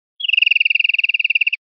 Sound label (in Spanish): absorbidos, verderon